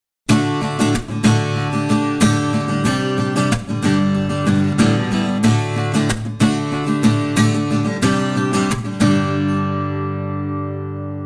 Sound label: Strumming Bridge